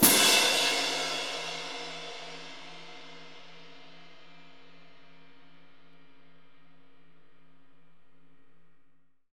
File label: CYM CYMB, Orch Crash; Roland LCDP03 Orchestral Perc; Orch Cymbals